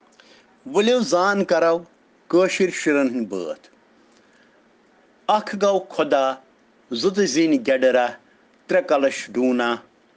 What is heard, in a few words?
Children Rhyms in Kashmiri